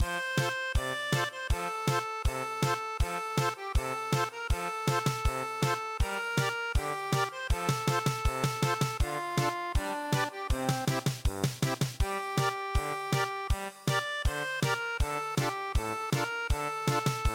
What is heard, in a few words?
chanson du toreador German Polka